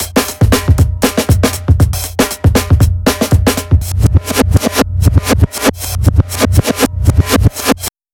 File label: reversal; LANDR